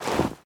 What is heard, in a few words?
Foot Deep Snow Run; Lucius III